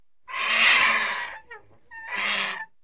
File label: pterodactyl screaming